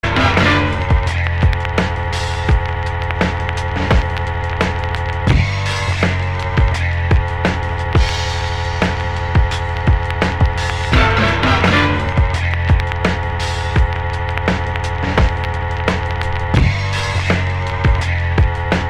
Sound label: instrumental